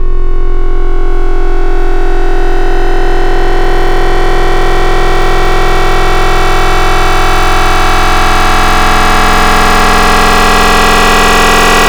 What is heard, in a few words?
Roarrr; Wavetables; Synthetic's Serum Collection; Cymatics - Jawz for Xfer Serum